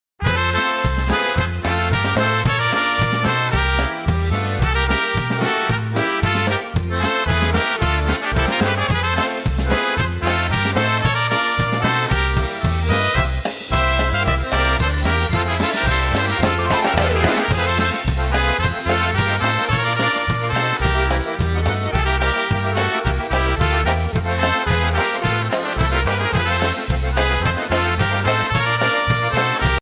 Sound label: Polka